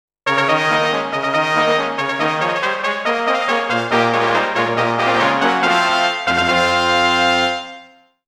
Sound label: Greatly Eager Fanfare; Monster Farm 2